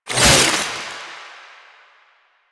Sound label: Stab Out; Kuva Lich Finisher Parazon; Warframe; Finishers